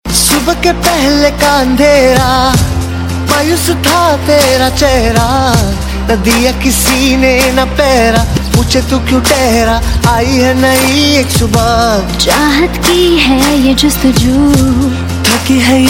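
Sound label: Hindi Ringtone, Bollywood Punjabi Pop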